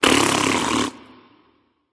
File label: tongue; Smite; baronsamedi